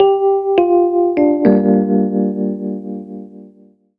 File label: keys, Roots Funk; USB Soundscan vol.41; AKAI